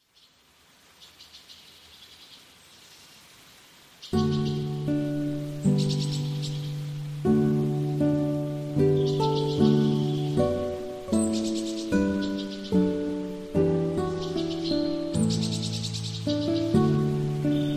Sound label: Forest Stroll; Joerao